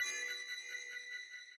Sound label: crystal blink loop; elements